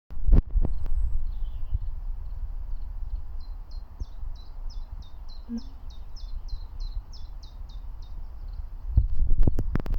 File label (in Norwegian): fugl